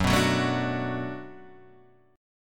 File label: D 6th Gb, chord